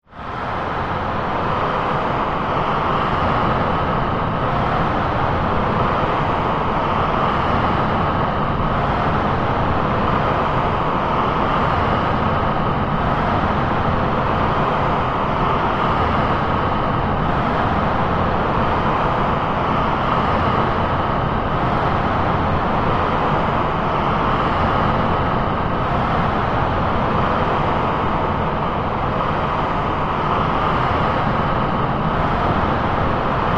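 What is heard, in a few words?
Steam Release Various, airy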